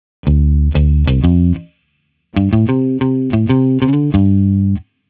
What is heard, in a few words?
bass bit